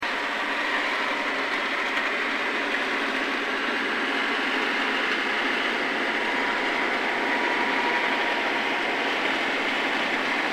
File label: Thames London Bridge